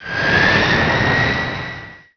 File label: carryall takeoff; Emperor - Battle for Dune